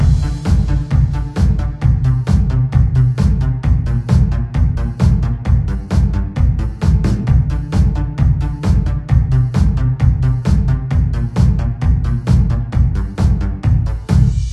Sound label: Disco; Jackpot Disco